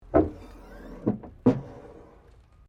WRECK HYDRAULICS EXTEND RETRACT, Wrecked Cars; impacts, crashes, scrapes; Sound Effects:Objects

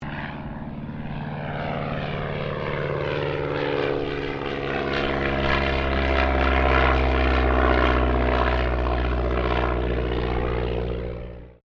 AIRCRAFT DH82A DEHAVILAND TIGERMOTH PASS; RAAF Tigermoth; Airplane Sound Effects; Vehicles

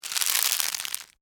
Wood Twist Handle, Stress; Foley; Wood (Sound Effects:Materials); BlastWave

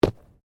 Body movement Organic Impact Medium; Body movement foley to simulate falls and deaths; Wrestling And Struggling

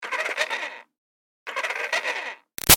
Toy Bounce Back Racer Med Far Revs Short, toy car; Toys; BigRoomSound

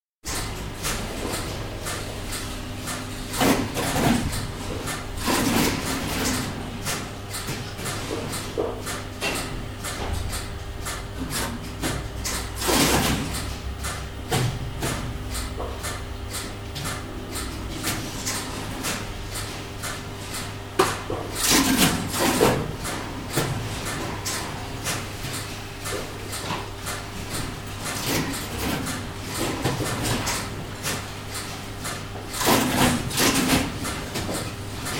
BT Crossbar electromechanical exchange; 1960 onward; Miscellaneous Office; BBC

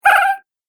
Cartoon Game Voice Hahaha; Cartoon Voice Sound Effects

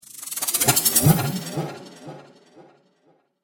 Dance Riser

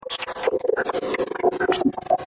Chopper Pan Airy Flange; Blastwave; Chopper Sound Effects

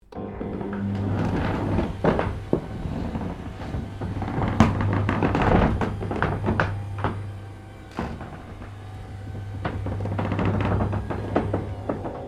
Door Roller Aluminium Garage Electronic Lower

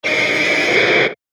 Digital Static Distortion Descend; glitches; Blastwave

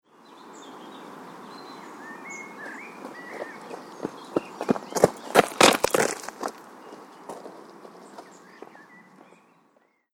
Running On Gravel Path; Outside Ambience Sound Effect

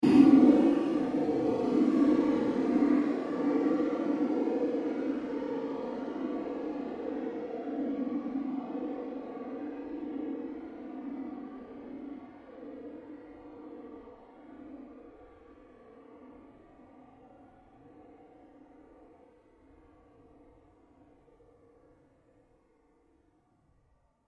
PULSE FADE LONG; sound building blocks created through manipulation of raw material; Rise And Dive

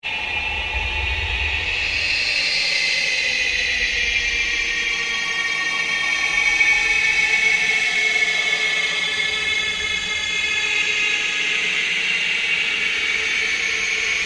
AMBIENT DRONE GHOST CHATTER SLOW; Sound effects created by manipulating other sounds; Scary Soundscape; Scary Soundscapes

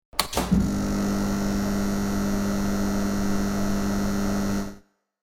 Power Switch, Big electric switch click.; Electrical Buttons; Multimedia

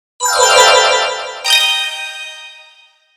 Bells Shimmer Chimes Ding; Production Element Imaging Element Accent Transition; 5.1 STEREO MIX; Blastwave

